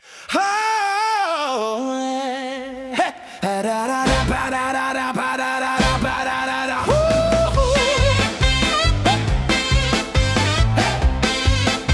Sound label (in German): Rock & Roll Uptempo; Paulinchen